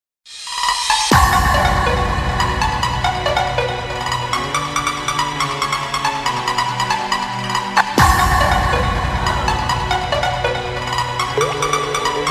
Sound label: trance